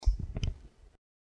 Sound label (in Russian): zadeli mikrofon vo vremja zapisi diktora